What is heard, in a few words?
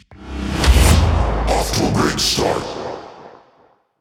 First Kill; World Of Warships